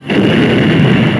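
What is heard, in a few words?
cannon fire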